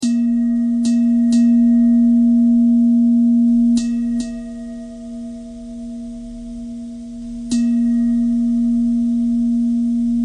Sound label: bell bell thread noise